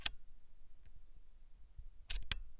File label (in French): VOILE PAUSE LONGUE